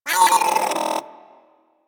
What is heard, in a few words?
Hyena Micro Vocal Pain; Warframe